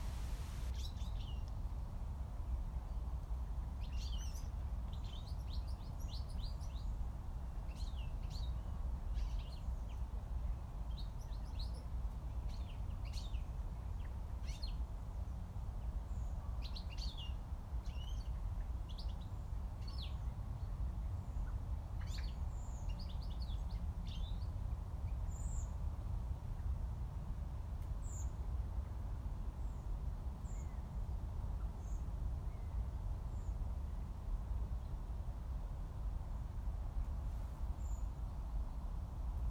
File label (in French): enregistrement chardonneret elegant